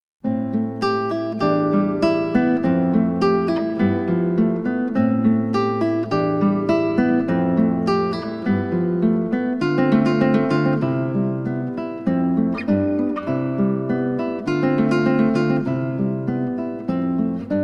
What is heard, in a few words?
Etude ballad